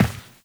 Step Default, Footsteps, Walk; Warframe; Tenno

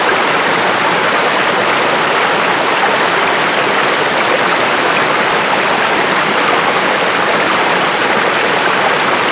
Forest River White Flow Fast; Props; Warframe; Grineer Forest

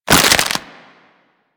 Stealth Neck Snap; Warframe; Characters; Tenno

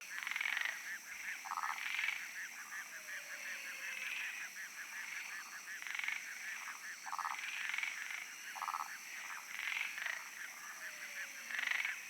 Frog Loop Night; Ambience; Midrath Island

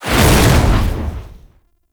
Demonium Summon; Warframe; Uriel

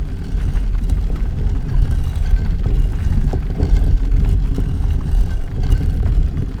Stone Pedestal Loop; UnderCity

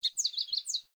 Wake Bird Idle; Cinematics; Sit Spawn; Dendria; Midrath

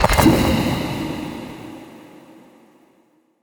Memory Go Board Opponent Capture; Warframe; Sacrifice

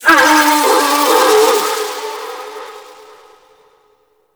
Kubrow Tame Fear Howl; Raksa

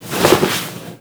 Misc Foley; Warframe; Characters; Tenno

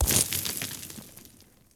Horse Surface Kickup Dirt; Warframe; Duviri Horse; Vehicles

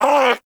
Armadillo Vocal Pain; Warframe; Characters; Hunting Critters

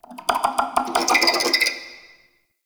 Interior Spider Clicking; Ambience; Warframe; Duviri; Murmur

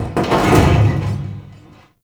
Large Vehicle Creak; Warframe; Ambience; NineteenNinetyNine

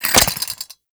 Seance Activate; Warframe; Harrow; Donda

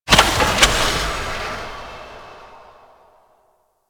Puzzle Secret Door Open; Ambience; Warframe; Corpus Gas City; Gameplay Remaster

